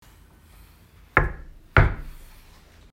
Knock Knock

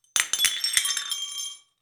Broken Pottery Cup

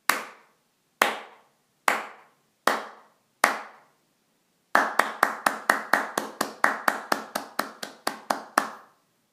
Clapping

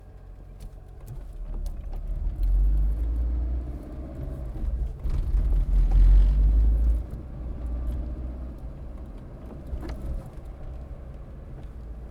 Old Car Interior While Driving

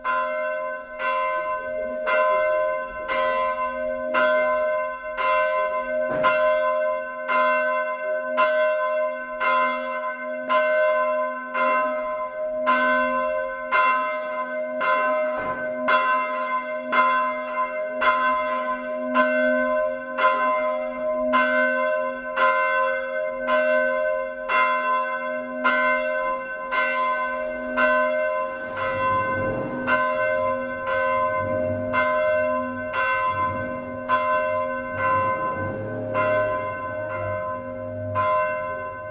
Church Bells

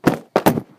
A Person Getting Knocked Down On Their Ass

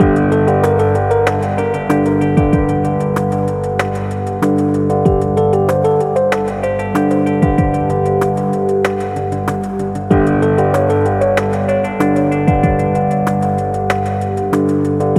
Crime Thriller Loop

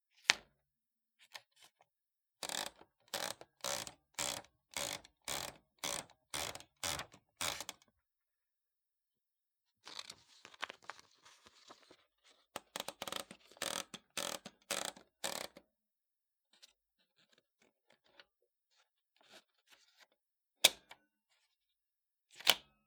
Fixing Paper In Typewriter Slow

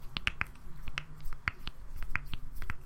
Fingers Cracking

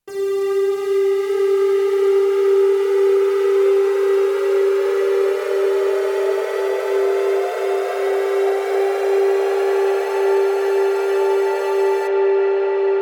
Sci Build Up

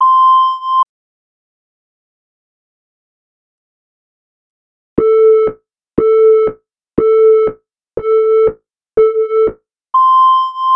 Alpine Clock Beeps

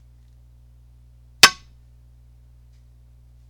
Claw Hitting Glass

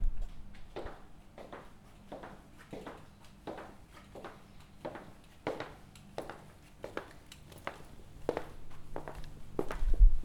Footsteps Far To Near

